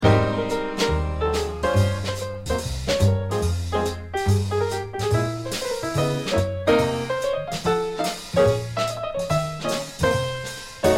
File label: trio